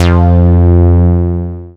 bass SY Bass Syn; Best Service Gigapack 2

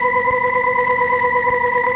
OPTIGAN B3; Sonic Reality; Vintage Timetraveler

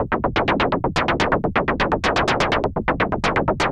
SH101 Clocked LFO Rhythm (125BPM)

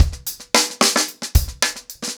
Hip Hop 110BPM, acoustic drums drumloops; The Roots Drumloops; Hail Hail Hip Hop

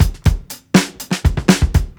FUNKY, breaks, drums; Basement drums vol 2

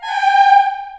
G4, CHILD, Choir; CHILD IHS

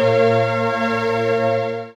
PAD Dance; Best Service Gigapack 1

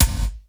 BG KICK, METALIC DRM; Crypton - DrumBase5000; METALIC DRM2

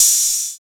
TR-606 Open Hi Hat

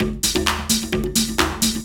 Groove 130; Dance Mega Add On Grooves

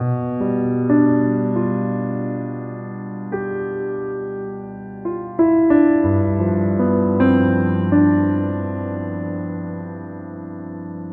Piano; Sonic Foundry (Sony Creative Software); Slow Silhouette

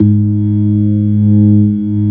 ORG B3, organs; Sound & Vision; Gigapack I CD 2

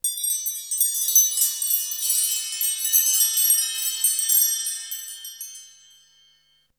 Chimes, Drums; ZERO G - Datafile DISC 2

